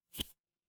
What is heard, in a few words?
ui toast